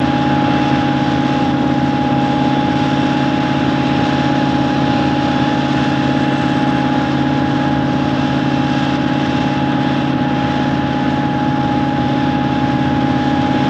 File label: Generator Hum Loop M